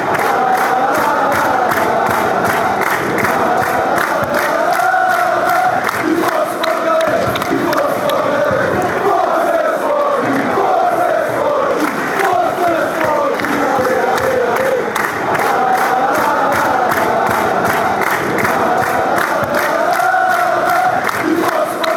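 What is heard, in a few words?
go sporting allez allez allez, football chant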